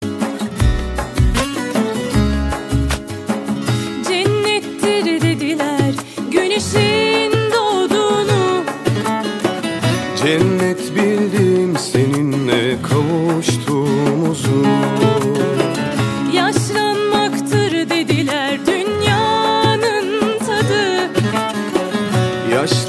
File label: Ringtone Singer